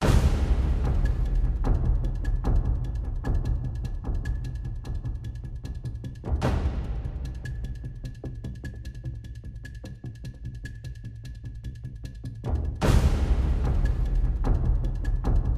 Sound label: Perc Loop